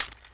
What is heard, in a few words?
nade ring